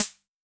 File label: Wurlitzer Swinging Rhythm Snare